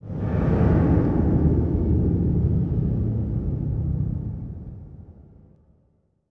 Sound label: Horror swoosh